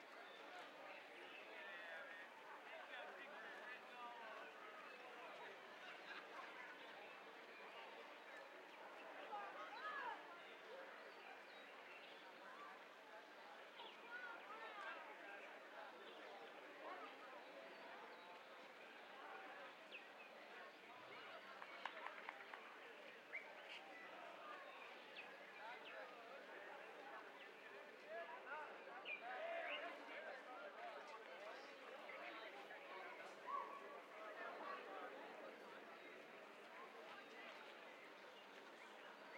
amb

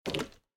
milk click

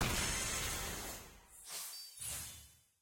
xp bar level up; bp tft; lol tft

